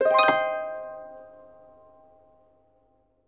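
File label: harp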